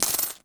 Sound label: coins bag